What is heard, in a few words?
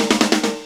FILL